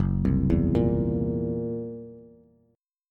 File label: Fsharp 7th Flat 5th down Bass